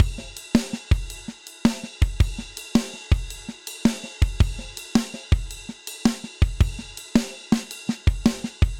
Rolling Triplets Ride Steely Tight 109bpm, Session Drums; Ableton; Factory Packs; Session Drums Studio